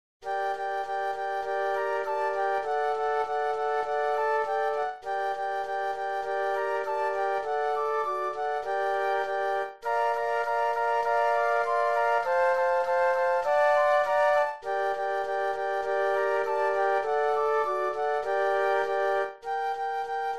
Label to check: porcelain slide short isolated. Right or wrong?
Wrong